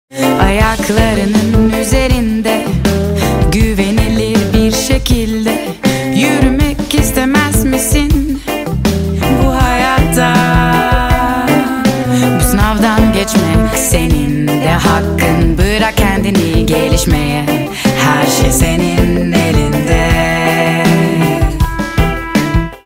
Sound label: jingle